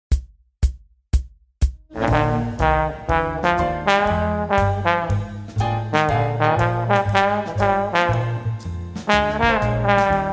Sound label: transcribing solos - trombone blues swing